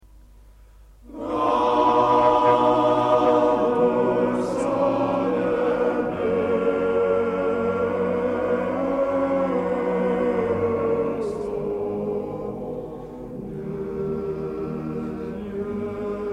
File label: hymn